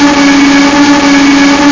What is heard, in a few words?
engine; WRECKAGE